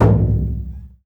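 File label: loud drum, Burundi; Heart of Africa; Spectrasonics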